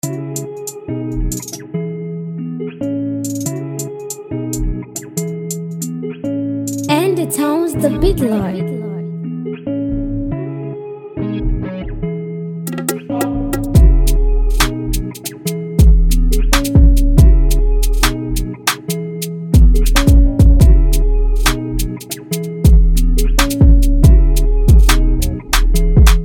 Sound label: UK Drip Type; Endeetone